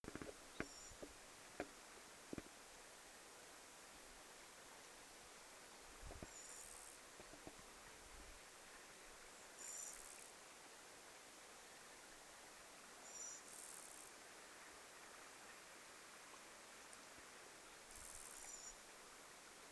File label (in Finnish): heinasirkka kuin vastailisi nuoren keltanokkarastaan aantelyyn